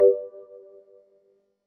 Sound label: menu select; freepixel; WordJourney